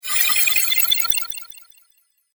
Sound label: Fantasy Spell, magic sound effect; Role Playing Game; Andrew Fleming